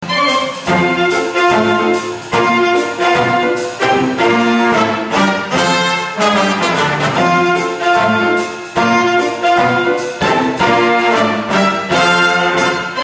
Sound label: jazz; 丸亀ユニオンコンサート '05; 丸亀ユニオン音楽教養講座